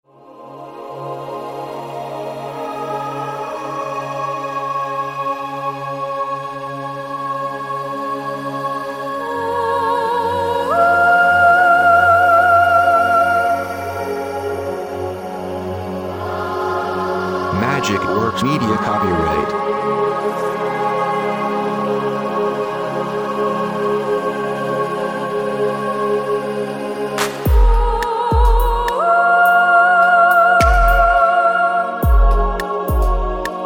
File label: zen electro; JAZZ